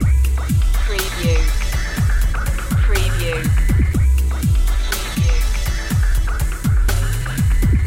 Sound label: tough trek loop